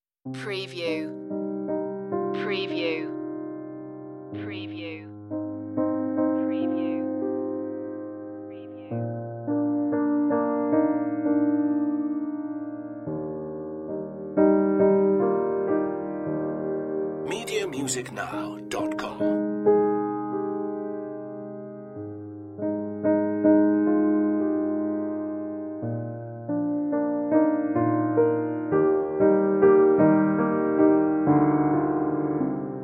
piano only

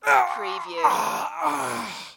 Human Death, Male Dying Sigh, Grunt

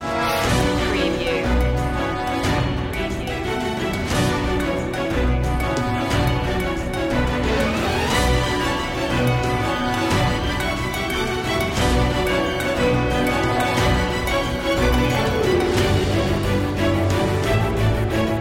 no choir

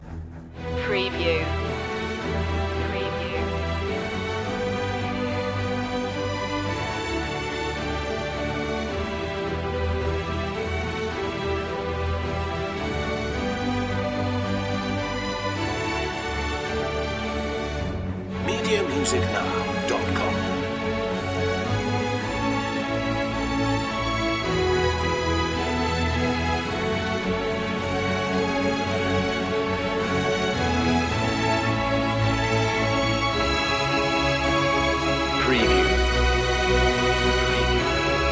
the conquest strings only

